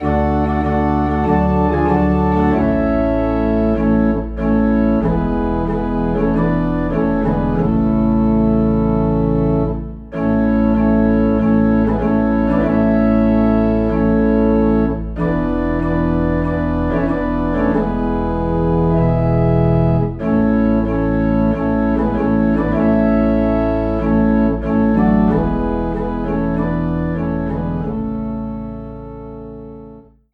Organ Low in A

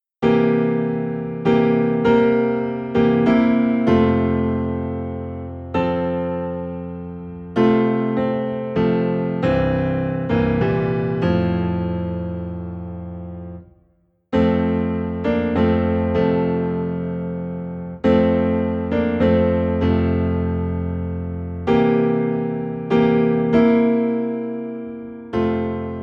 Piano Low in F; Silent Night; christmas

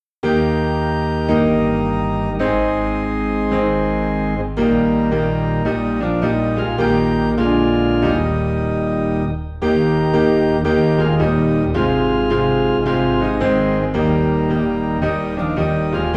Piano and Organ Low in E Flat; Leaning On the Everlasting Arms

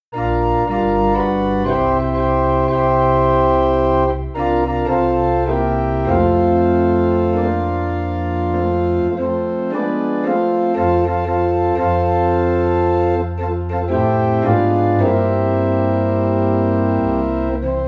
Organ in F

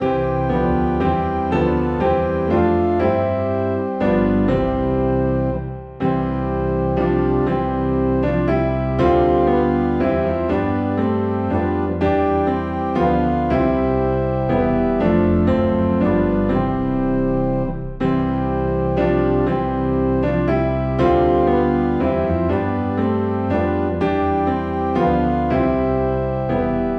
Piano and Organ Low in C; Come Thou Long Expected Jesus

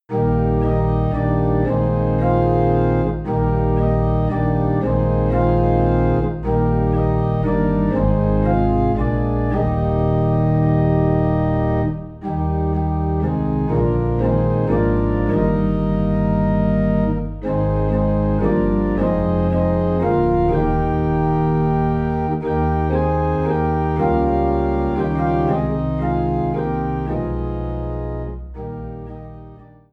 Organ Low in E Flat

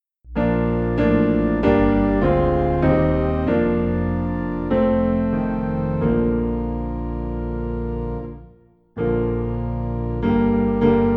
Piano and Organ Low in B Flat; Fairest Lord Jesus

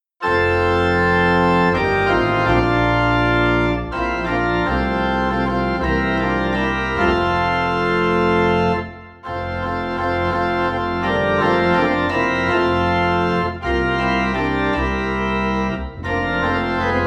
Organ Low in F

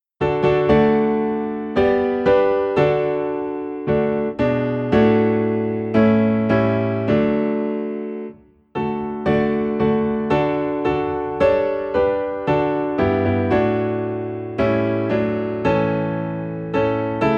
Piano in D; What a Wonderful Savior

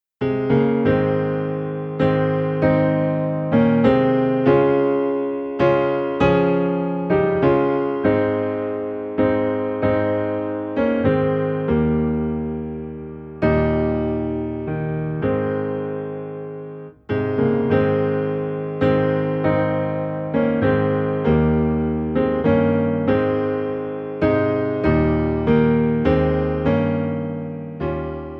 Piano Low in A Flat; Just As I Am

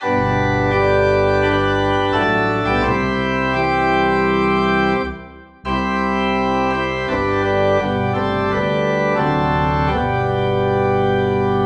Organ Low in G; God be With You

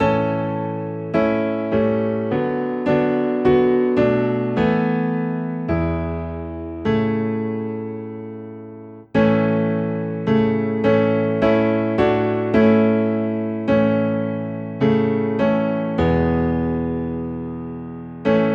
Piano Low in A, hymns; Break Thou the Bread of Life

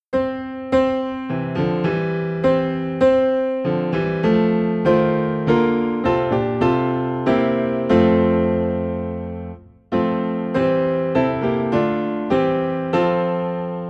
Piano in F; Rejoice Ye Pure In Heart

